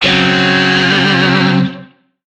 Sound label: Shawzin Hirajoshi Chord B; Warframe; Lizzie Shawzin